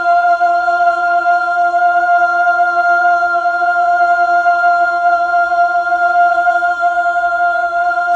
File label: Fem F3, Mellotron Female Choir